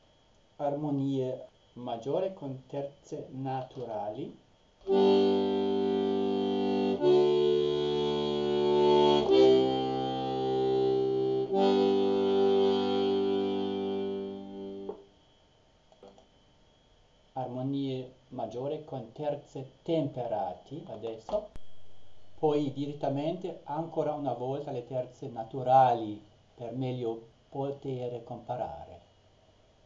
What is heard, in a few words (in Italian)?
accordi Maggiori Con Terze Naturali, akkordeon